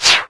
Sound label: space minimize; windows 9x; sound schemes